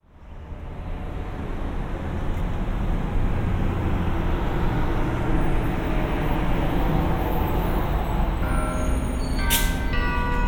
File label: Parking Exit Alarm